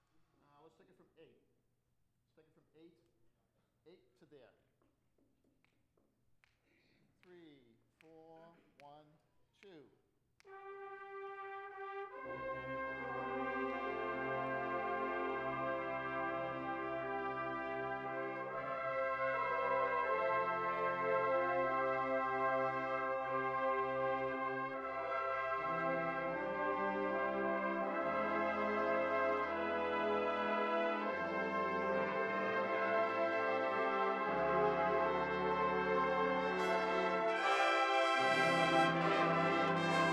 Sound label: TAPS, brass ensemble practice; lynn ma